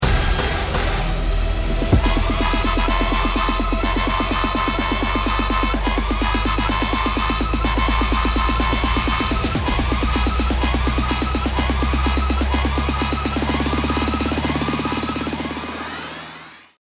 bomb ten sec count

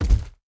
hunter double step; ambient; Half Life 2; Episode 2; outland

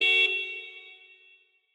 car honk distant

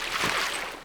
wade, footsteps; player

